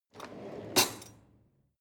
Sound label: drawer cutlery draw out